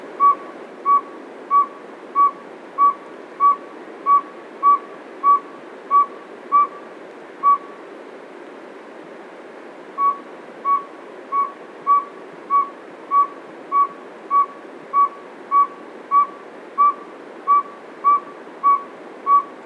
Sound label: Aegolius acadicus, Zaaguil; uilen; Strigidae